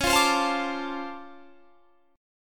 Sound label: D-Minor 7th C sharp, chord